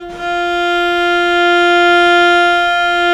VL F3, VIOLE, Historical Instruments; USB Soundscan vol.23

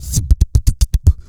BEATER BOY, Vocal Planet, Blues Soul; Spectrasonics

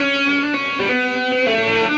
Sing La Dee Dah; Sonic Foundry (Sony Creative Software); Friendly Fire; Serpent

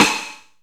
Shock Snares, Percussion

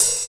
OP HAT; East West - Phat & Phunky CD2; 90's Sample CDs

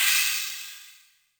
Cymbals, Elektron MachineDrum SPS1 MKII

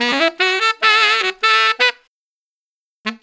Tenor Sax; Sonic Foundry (Sony Creative Software); Jazz Solos and Sections; Tenor Loops

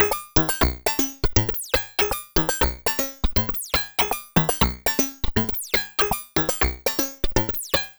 Synth Arpeggio, Synths; Eclectic Electronica

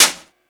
Jazz Drums, E-MU Pro-Cussion

